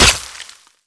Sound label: hit, shuriken; weapons; garrysmod